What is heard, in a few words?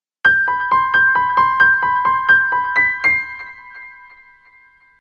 piano, notifications; Bluboo; Xfire-5.1